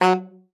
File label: F#3, ALTOSAX; Xsample VOL-2